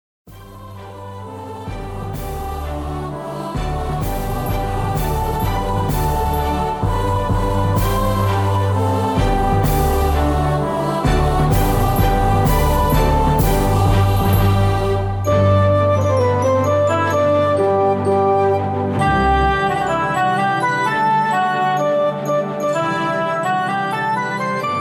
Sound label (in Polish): Instrumentacja Dworski taniec